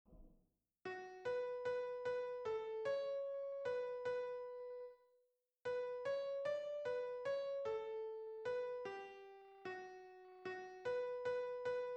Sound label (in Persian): گیتار